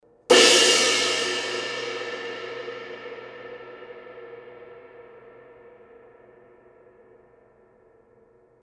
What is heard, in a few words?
Kerope, cymbal sound